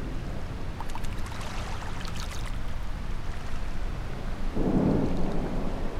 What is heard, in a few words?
lake waves